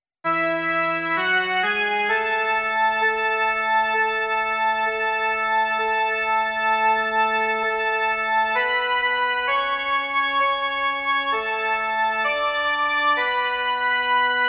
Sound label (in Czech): jen melodie; Zpívejte Bohu píseň svou